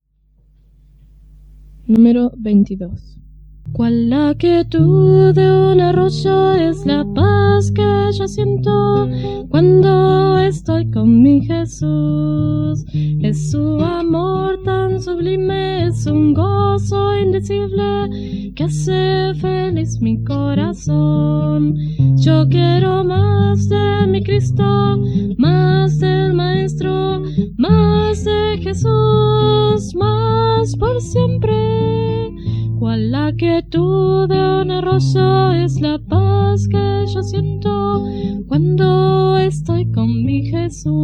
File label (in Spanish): Coro, himnario niños